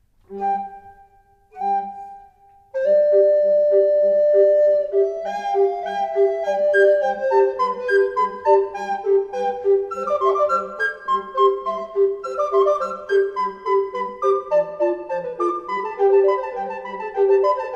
Tambourin, recorder

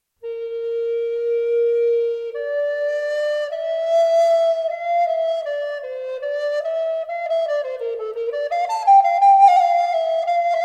Lydian Impromptu, recorder

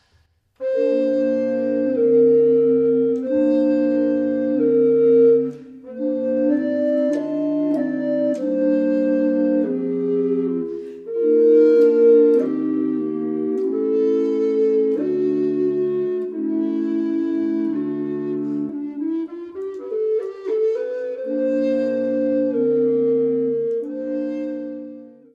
Berceuse Basse, recorder

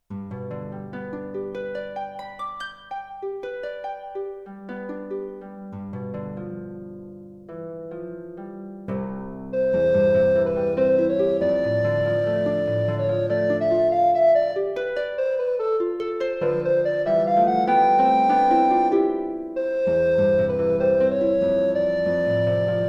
Duet for Recorder and Harp

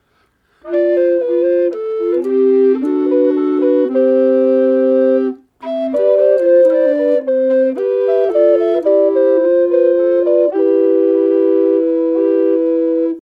Trio, recorder; Machaut

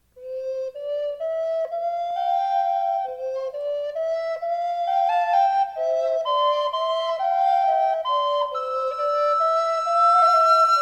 Quebecois folksongs, recorder

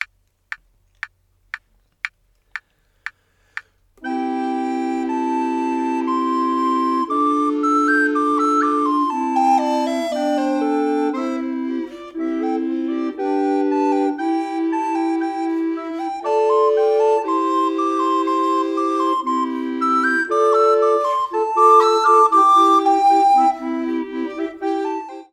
SATB, recorder; Tham-ma-rong

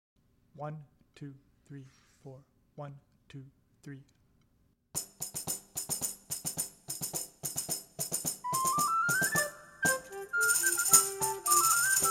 Sunburnt Mirth for 2 recorders and percussion

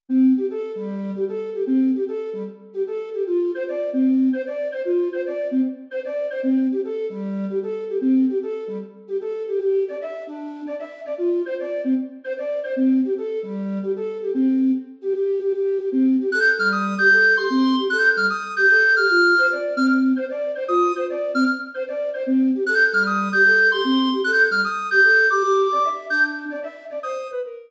Boogie Woogie, recorder

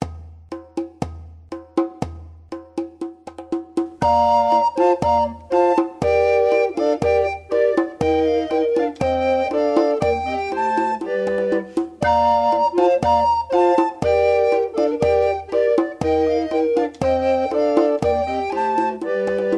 Ronde VII all louder, recorder

